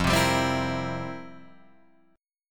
F Major 7th Suspended 2nd Suspended 4th, F, down, Guitar, Drop D